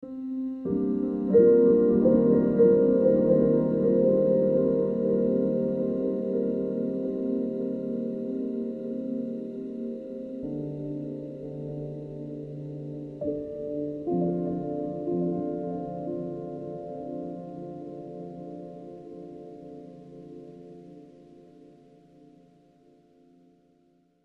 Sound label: Dirty Piano; Beds